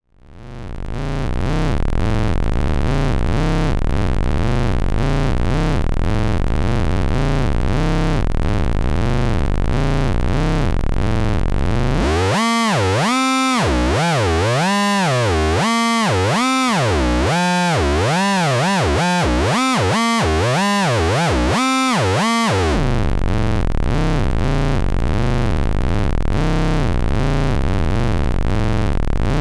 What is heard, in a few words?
Xaoc Devices Leibniz Binary Subsystem 8Bit Twisted LFO